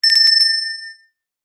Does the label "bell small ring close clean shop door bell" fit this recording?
Yes